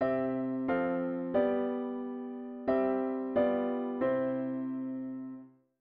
chord prog chunks diat inv and V7

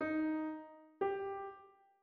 int up Eb G

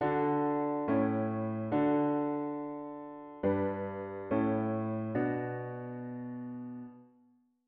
diat root w bass

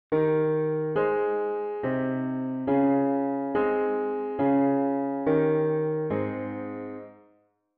root V7 bass

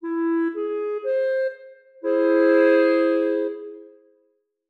treble triad aug E

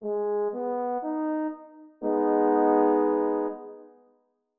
triad minor Ab3

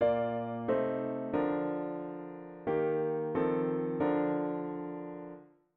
chord prog chunks diat inv and V7 inv

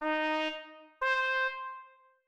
int up Eb C

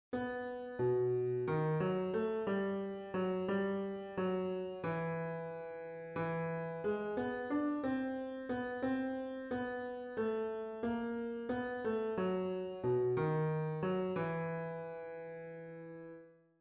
Mel dict chromatic long

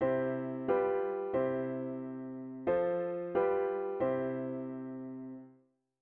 chord prog chunks diat inv and V7

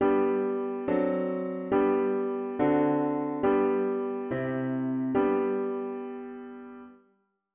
Harm Dict diat inv V7 inv bass

